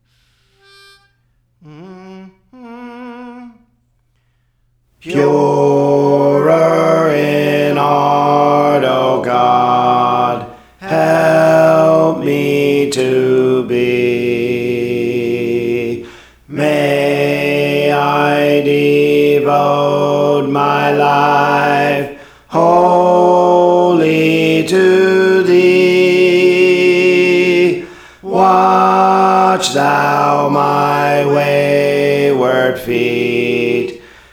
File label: Alto, Praise For The Lord Training